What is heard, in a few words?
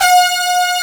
C4 POWERCS, HIP KITS